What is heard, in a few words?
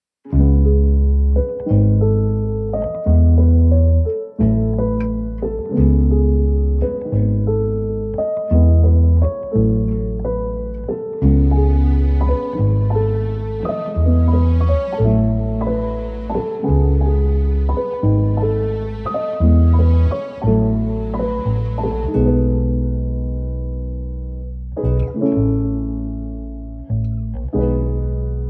Piano; Anger Music Library